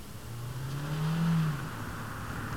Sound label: engine